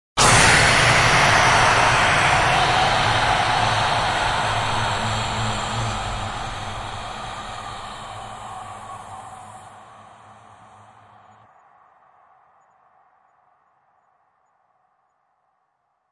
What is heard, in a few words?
Choir Whisper Blasts; Epic Tools vol. 1